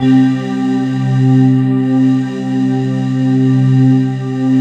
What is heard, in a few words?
SYN, Wavestation